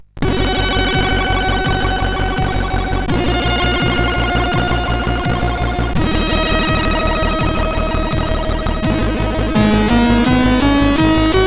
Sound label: Samba sid CSG8580R5